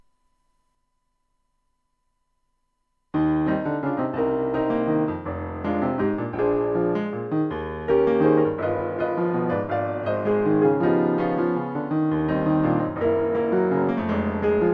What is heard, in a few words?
piano; zongora